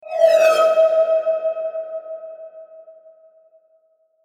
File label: Whoosh Filter Ring Feedback, Whoosh Shimmer Bright Warble Reverb; Blastwave